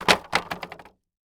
Impact Wood Pallet Drop On Plywood; Impacts

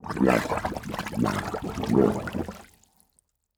Liquid Water Water Bubbling With Tube Noise

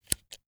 Industry Tape Measure Button Clicking

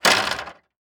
Industry Ladder Movements Ladder Rattle Short

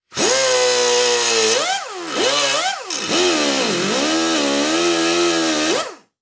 Industry Tool Grind Metal Grinder

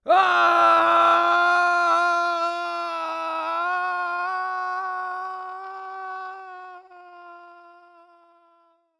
Cartoon Human Male Screams While Falling

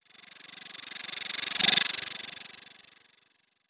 Title Transition Reverse Fly By Reverse; Production Element

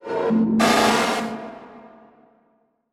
Transition Hit Impact Warble; Production Element

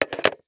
Electronic Phone Hang Up; AdobeCC

